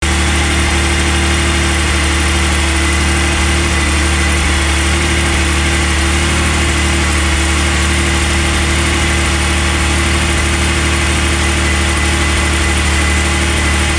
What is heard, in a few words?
engine ticking noise coldstart; 718 gts 4 0 gt4 gt4rs spyder 25th anniversary